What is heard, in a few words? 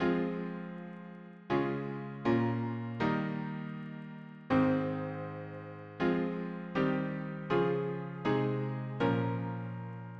harmony piano